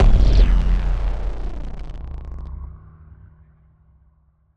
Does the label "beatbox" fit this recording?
No